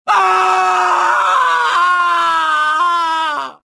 falling; Star Wars Jedi Knight II Jedi Outcast; XBOX; bespincop

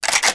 Interface Start Game

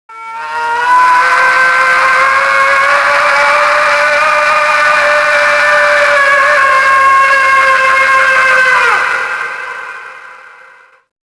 horror scream; drugtrip; Max Payne

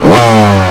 jump; YAMAHA

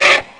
Bark, Small Spearleg, Aliens

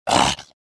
gasp, trandoshan; trandoshan1

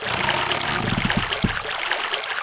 watermill; Pirates Of The Caribbean

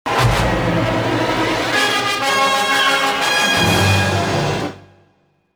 good small, music; Star Wars Jedi Knight II Jedi Outcast; XBOX

